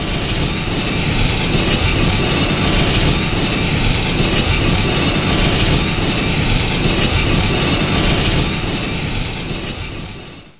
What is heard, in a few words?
steam; borg